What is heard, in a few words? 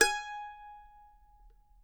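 G#5, Mandolin; Sampleheads; Whole Lotta Country 1 & 2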